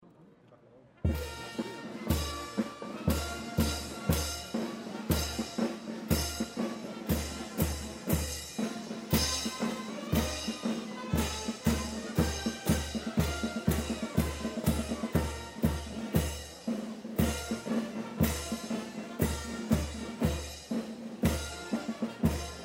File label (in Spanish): PROCESIÓN BANDA MÚSICA POLICÍA NACIONAL; SEÑOR DE LOS MILAGROS